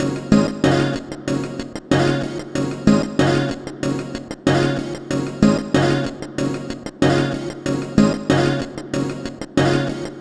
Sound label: Beat, 94 bpm